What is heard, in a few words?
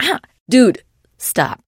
trader pain respond; 商人杰恩（七日杀）